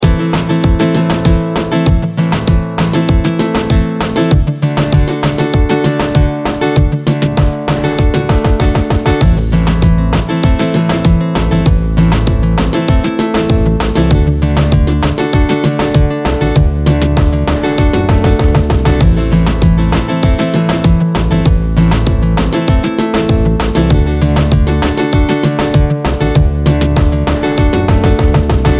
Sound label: latin vibes